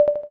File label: Xylophone